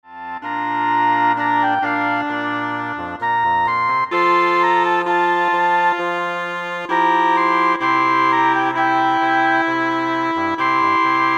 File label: Woodwind Ensemble